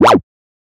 Glitch, One Shots, Glitch FX

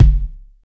DEEP BD, bass drum; BASSDRUMS

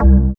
BASS STER.

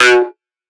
synth shot B; Electro; Anthemic; synth shots

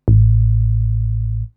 SNTH BASS TEKNO 140 A

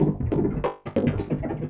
Industrial 140

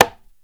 CONGA SL; drum; PERCUSSION

